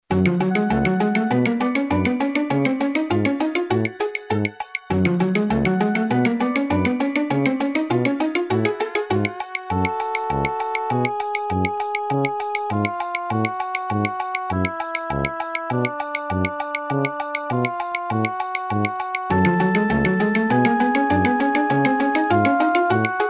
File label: Ring Tone (Poly); Smeshariki: Kaleidoscope of Games